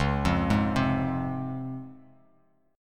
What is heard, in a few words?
Db Major 7th, Bass Standard; arpeggiated; JGuitar